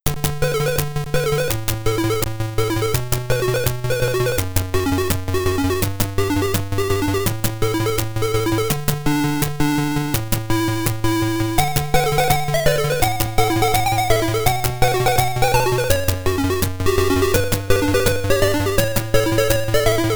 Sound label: Pachelbel's Canon, Atari ST; lemmings